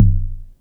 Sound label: SYNCUSSION